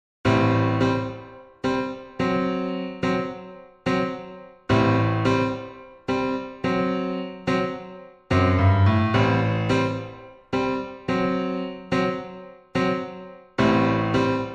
Latin Special Langsam; Pop

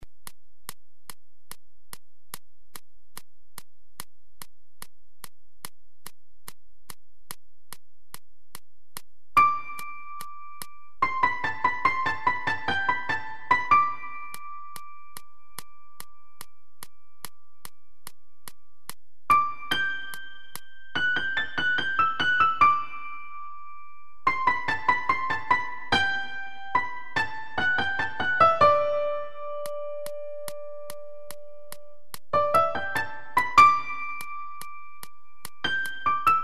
Rumba 145; Uebungs-CDs; Playbacks; Seiber

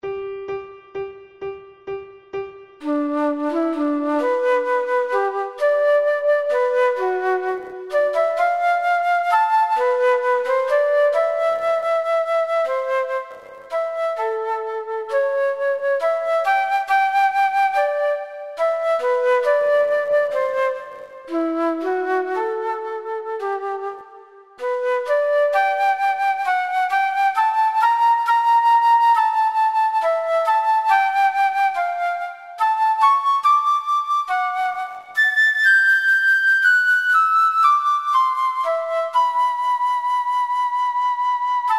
D-Dur Flute; Romanze Floete Klavier; T130; Reger

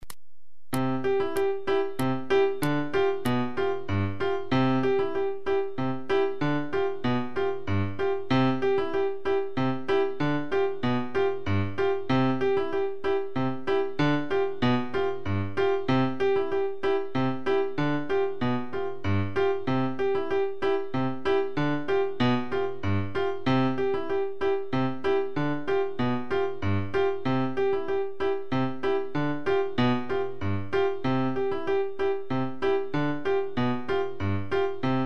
Paso doble 95

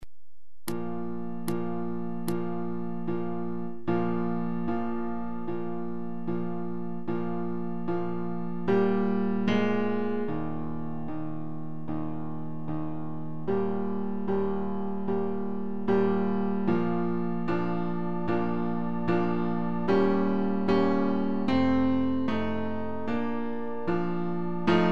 Blues 75

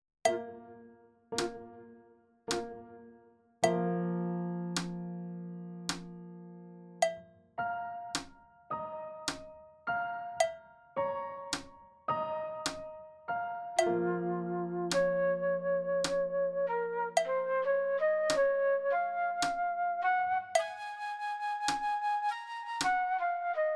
Piano Flute Medium; Uebungs-CDs; Playbacks

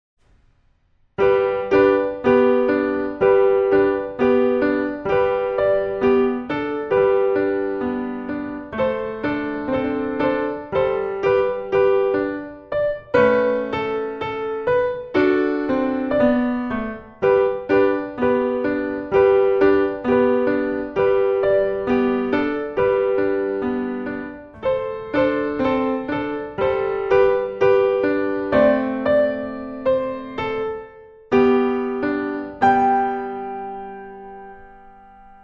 Jingle Bells

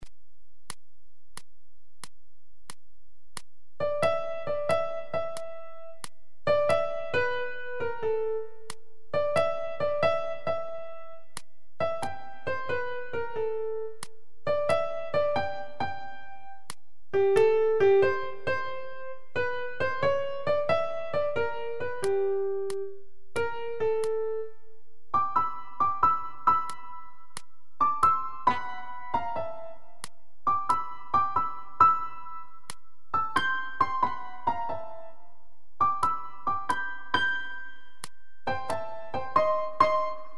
Slow-Fox 90; Uebungs-CDs; Playbacks; Seiber

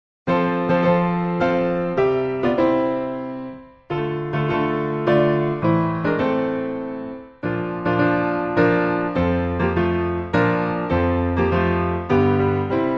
Mazurka F Dur; Chopin; op 68

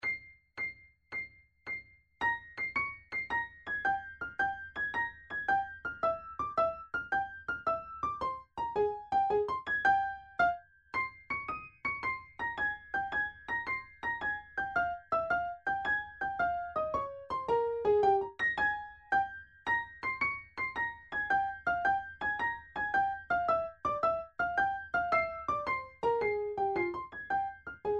Tarantella Primo T110

